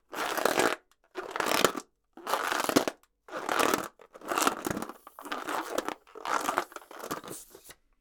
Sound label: Balloon Scrunch Multiple